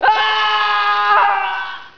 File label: scream, scientist